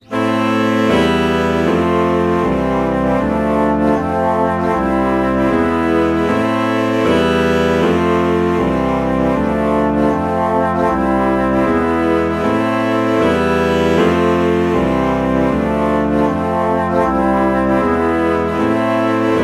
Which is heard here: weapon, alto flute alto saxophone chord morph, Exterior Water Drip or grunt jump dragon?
alto flute alto saxophone chord morph